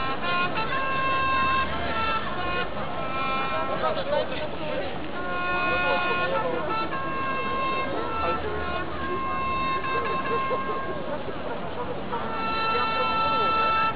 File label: clock tower n square; Krakow; Poland; Europe